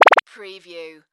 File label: Blip Beep; sound-effects-bible